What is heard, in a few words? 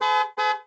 Car Horn; blue archive